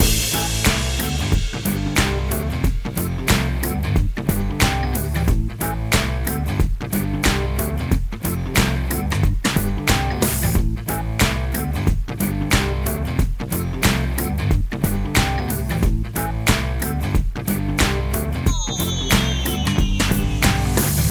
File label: Music Chill; Learn to Fly 3